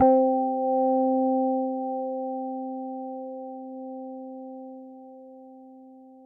EPIANO; Lomarz Stuff

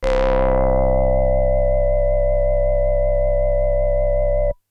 SYNTH Flute Saw Raw

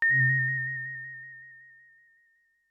Synth, Pure Tone Hi

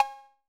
Cowbell 808 Color Short

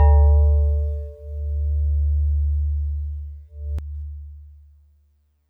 DX100 D2; David Lynch

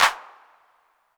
Clap 808 Color, Hand Clap

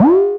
Skreeech

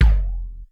BD Dead

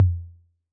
Tom Low C 808, Clean, Digital

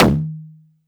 Tom High

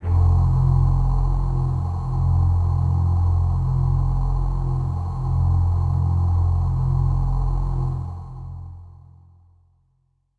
Voices Kawaii F0

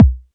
BD

